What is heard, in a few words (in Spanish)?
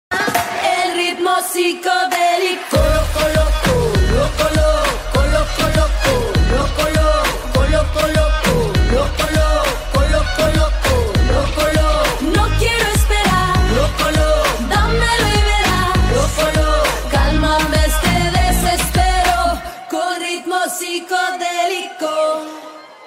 El Ritmo